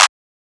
Clap

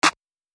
Clap

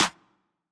Open Snares

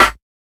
Snare

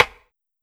Rim; Percs

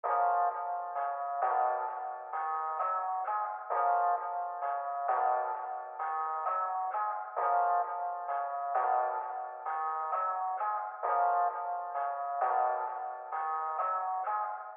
Antidote Loop

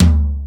Percs

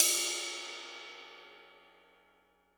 Cymbal, Crashes & Cymbals; Str Cym3

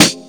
perc

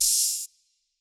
Hat Open; Closed Hats

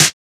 Snares

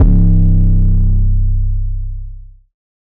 808 future bass C; 808s

